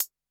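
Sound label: Closed Hat, Electro Kit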